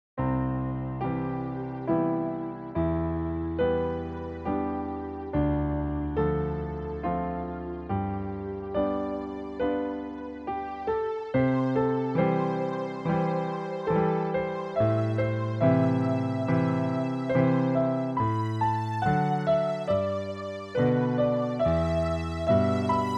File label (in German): C Dur G Dur